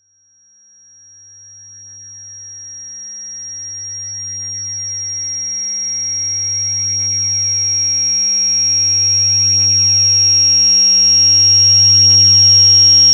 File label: Formant Fast LFO